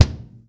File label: Kick Acoustic